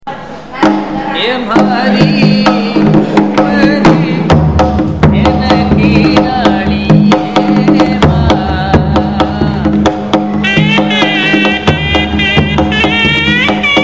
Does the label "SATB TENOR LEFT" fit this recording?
No